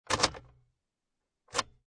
Transportation Automobiles, Car Interior, 1996 Acura Integra, Insert Car Key And Remove Car Key, No Engine